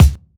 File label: dry steel kick drum sound c sharp key